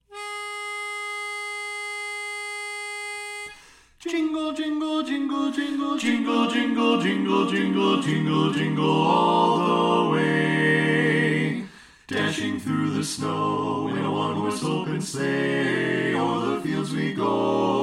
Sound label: Jingle Bells Full Mix; christmas show